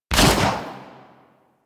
Redux Fire Shot, Weapons; Lato Vandal

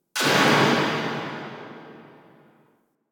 Air Pistol Tail; Warframe; Corpus

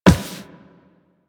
Walk Metal, footsteps; Warframe; Operator

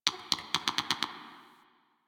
Drifter Camp Bird; Ambience; One Offs; Birds; Warframe; Quests; The New War

